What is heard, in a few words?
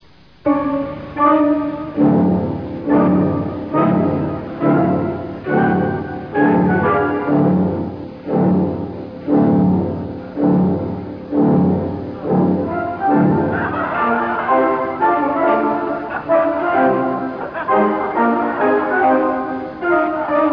organ